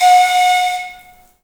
AF FLUTES, Heart of Africa

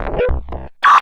BLURP HI, Hard & Loud Techno; USB Soundscan vol.01; AKAI

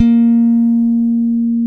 A#3, FINGER BASS; AKAI S-Series CD-ROM Sound Library VOL-7; FINGER BASS1

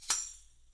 TAMSHA, EFFECT CYMB, 3D Drums; 3D Sound

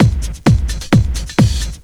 techno, DRUMLOOPS

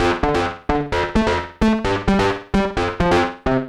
Mixed PWM B 130 D, modular 130bpm; modular madness

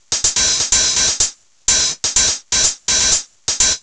drum loop fortune hat 125, Classic Chicago House; Sample Magic; Drum Loops

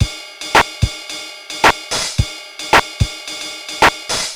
Korg MR-16 (110BPM), beats

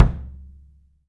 KICK, 3D Percussives, Studio Kit; E-MU Producer Series Vol. 5 – 3-D Audio Collection

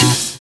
LE BASSIS; Univers Sons

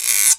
GUIRO, Ragga & Dub Reggae; PERCS KIT; USB Soundscan vol.27; AKAI

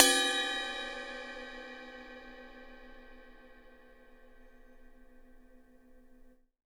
CRASH18 BELL; Big Fish Audio; Ross Garfield Drums VOL-2; CYMBALS

